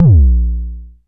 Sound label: m base, Jomox Mbase01